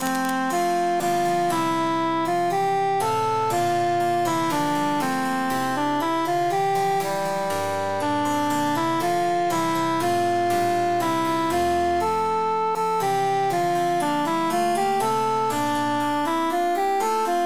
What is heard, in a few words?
quintus emphasized; silver swan